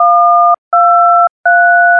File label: touch tone triplets, synth and electronic loops